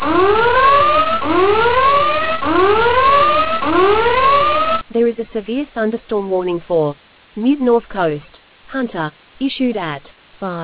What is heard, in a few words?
storm audio